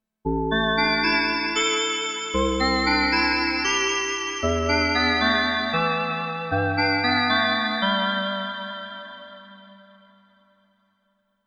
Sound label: Morning Bell; KORG Flare